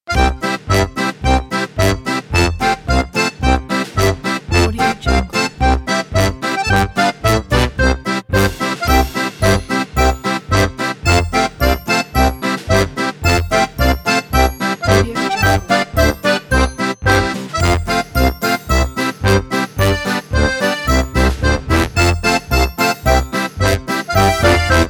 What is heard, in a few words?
oktoberfest